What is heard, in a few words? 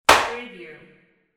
smack